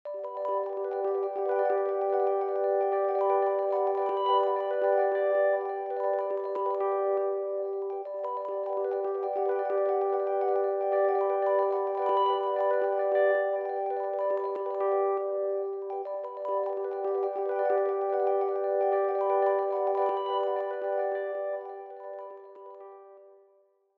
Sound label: bells